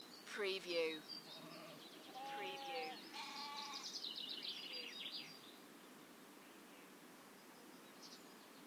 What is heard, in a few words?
Nature Ambience Sheep Bleating; beanstalk audio